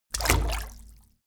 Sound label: elements water walk foot in; smartsoundfx